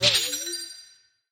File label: cast